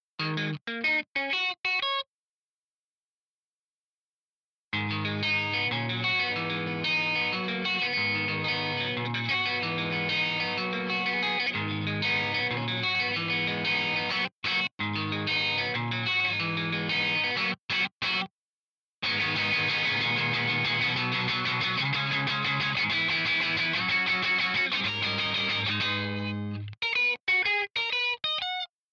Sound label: GTR cream